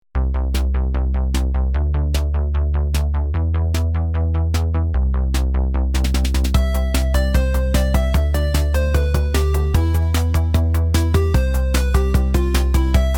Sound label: game music